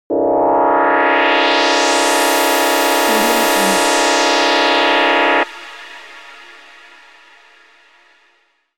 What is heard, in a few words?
science fiction space rocket turbine fire power